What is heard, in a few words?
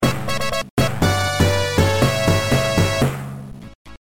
1UP; Sonic